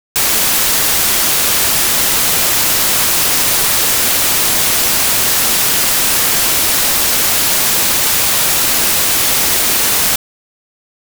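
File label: test sound 96kHz stereo, hydrophone; preamplifier for hydrophone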